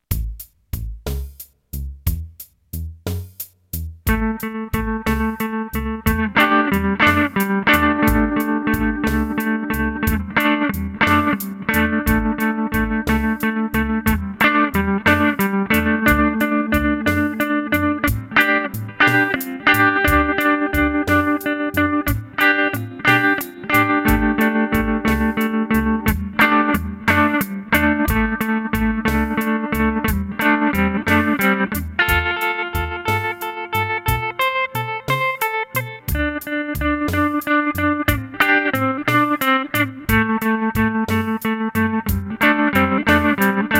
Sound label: riff